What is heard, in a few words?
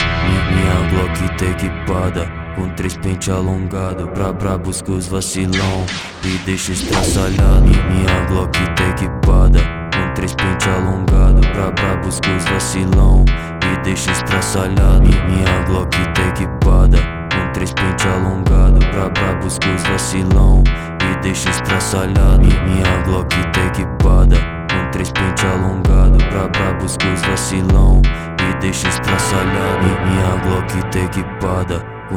Phonk Music; فانک phonk